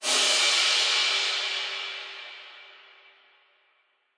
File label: shadow crash, drums single hits